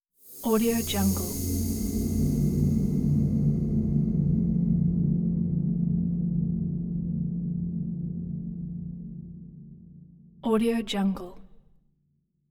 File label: mystic sandy airy stinger